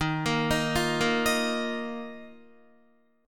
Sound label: Eb Suspended 2nd, Guitar; arpeggiated; 11,13,13,10,10,10; 4E,4A,5D,5G,5C,6F